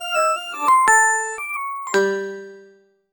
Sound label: money being rewarded in a, reward